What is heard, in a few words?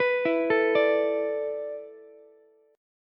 A Suspended 2nd Suspended 4th, down, Ukulele; Asus2sus4; arpeggiated; JGuitar